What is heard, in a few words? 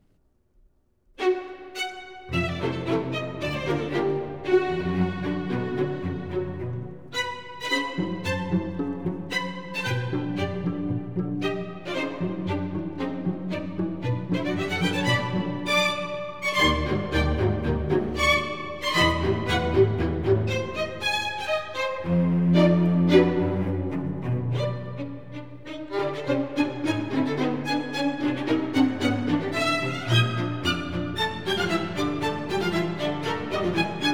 Preludes Allegretto, Moderato